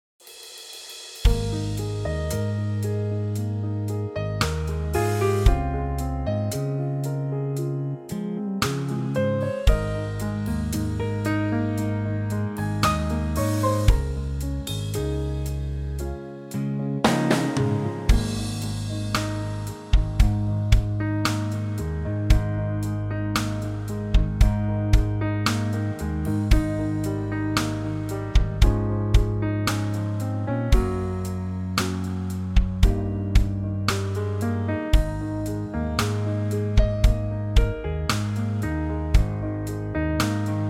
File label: trio Ab